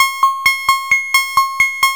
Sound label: FM BEAT, FM LOOPS; Zero-G Ambient 1